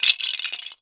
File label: fall apart sound; lego star wars